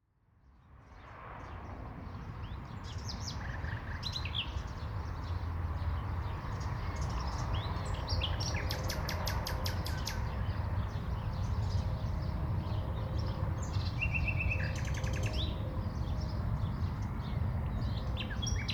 city ambience park spring with birds cars and planes; General Ambience